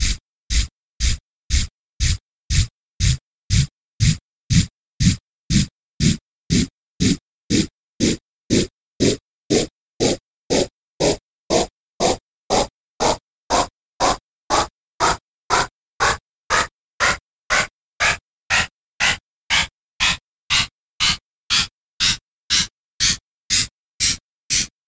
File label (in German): breath noise tonleiter